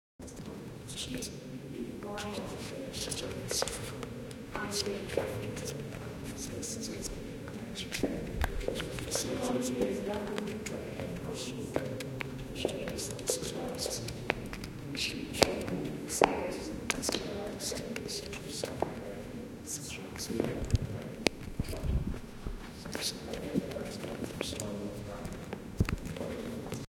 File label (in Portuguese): sala de aula com pessoas